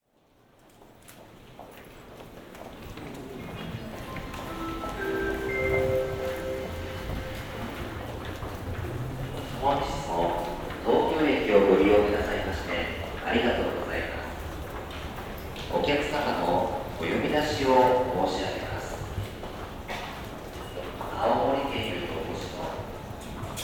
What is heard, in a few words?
train station; cs-10em; tokyo